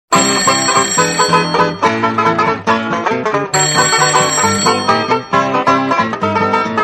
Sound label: Wake-up Alarm Sms